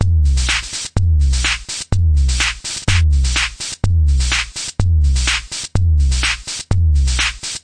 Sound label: 125 bpm lofi drum techno